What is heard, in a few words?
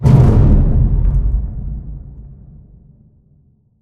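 Explosion Rumble Interior Distant Punch; Boomtown; Titanfall 2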